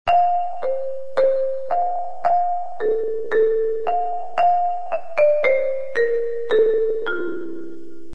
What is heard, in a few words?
drums; sonatina